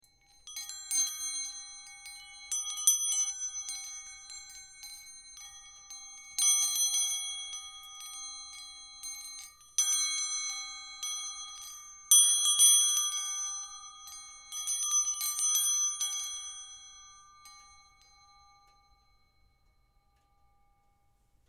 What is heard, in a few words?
templebells trio